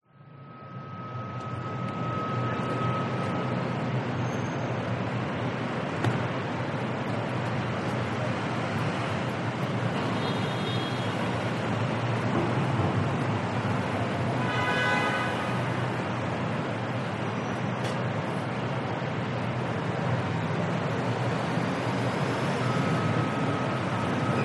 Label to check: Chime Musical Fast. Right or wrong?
Wrong